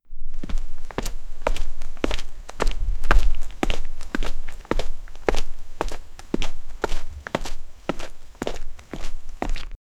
the footsteps quicken, hurried

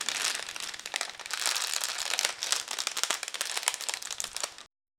plastic armor clanking

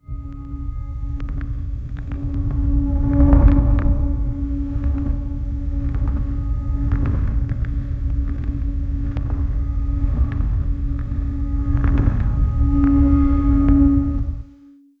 triceratops deep growls, bellow

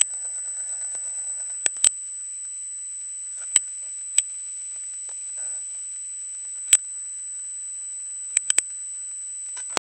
communication

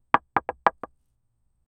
single knockstone balls, collision

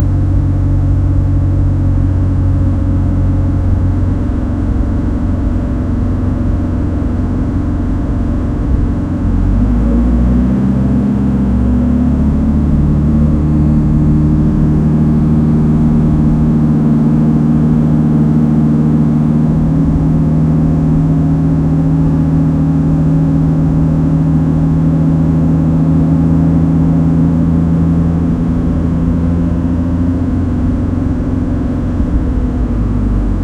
trolleybus engine sound, braking

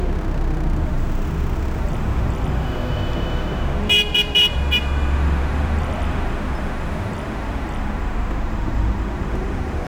busy city street ambience, car horns

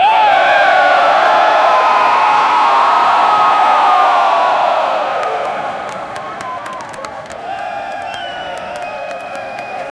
a large packed football s, clapping

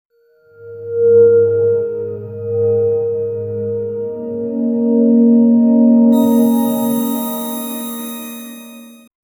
serene and mysterious amb; spa

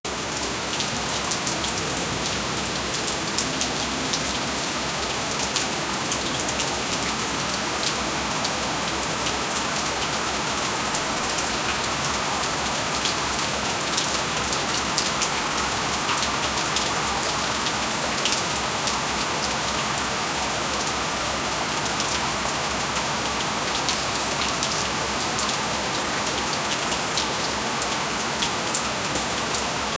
heavy rain in the tropical

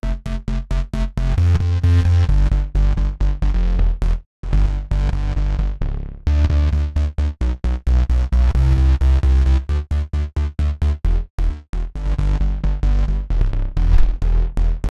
techno bass for techno; dance music

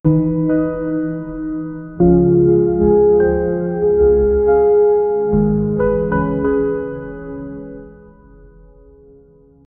elegant corporate backgro, ambient pads; SFX Engine

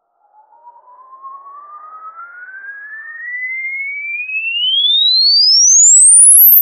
charging sound; scifi

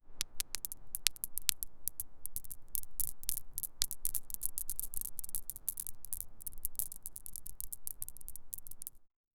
kinetic sand cube droppin, textures

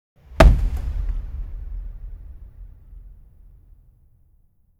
soft wooden thud

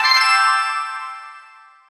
slot win sound effect; slot machine

